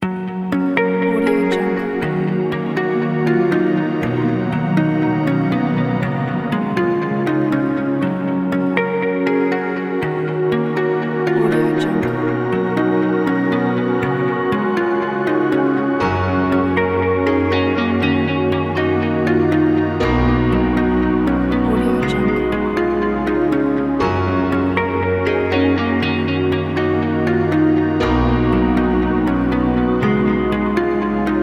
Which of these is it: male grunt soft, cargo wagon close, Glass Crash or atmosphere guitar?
atmosphere guitar